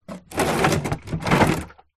foley plastic water tank small drag ground water inside; zapsplat